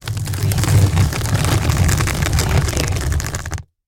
Crypt Door Close

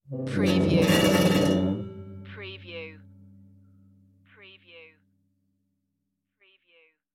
move metal furniture

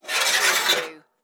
Metal Scrape

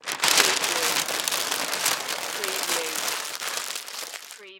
Easel Paper Crumple; Sound Effects Bible

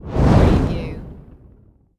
Fireball Pass By Whoosh

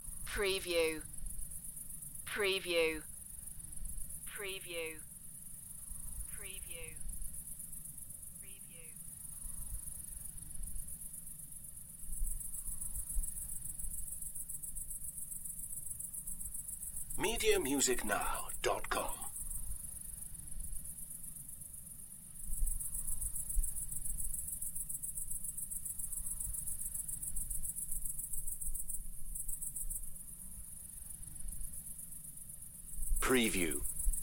Crickets Chorus, Cicadas Singing; Nature Sounds / Ambiences

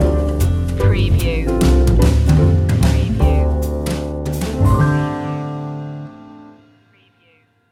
what child is this jazz stinger

